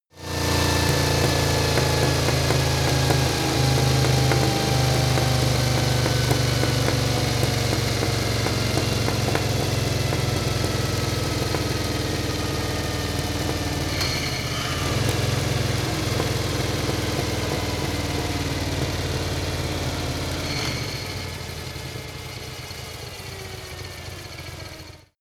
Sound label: motorcycle harley engine decelerate